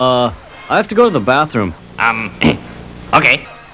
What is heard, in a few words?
choke